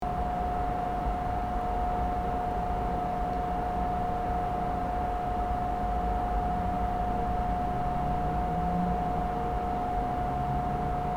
Empty train station at night industrial ventilation loop